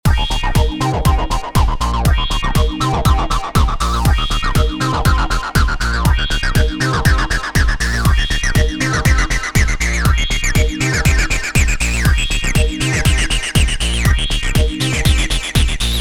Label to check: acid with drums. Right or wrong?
Right